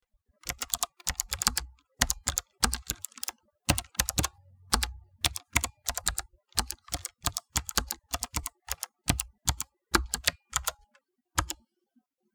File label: Slow pace Typing on mechanical keyboard